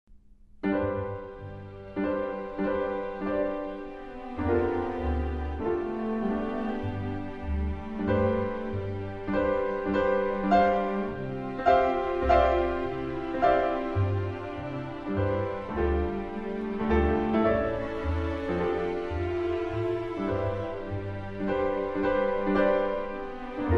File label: Piano Concerto